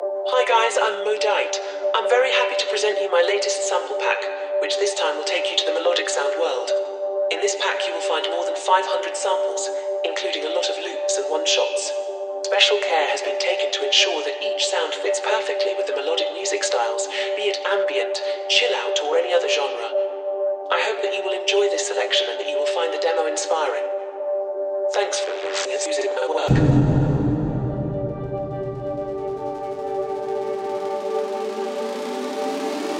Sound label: MELODIC DUBSTEP; MODEIGHT; MELODIC DUBSTEP ESSENTIALS VOL 1; EDM Templates; EDM Templates Sample Mega 2026